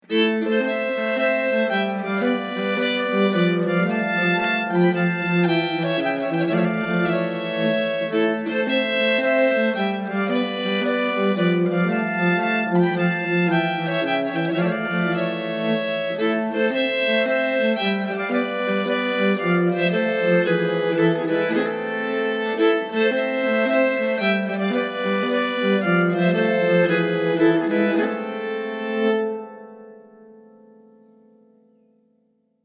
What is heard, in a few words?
Polska fran Gnarp, nyckelharpa